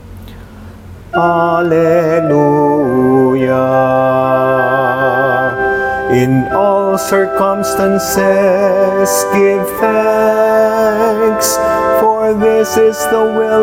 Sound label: Alleluia Verse; OT 28th Sunday